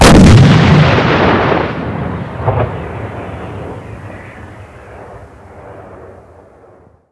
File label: Vehicle Weapons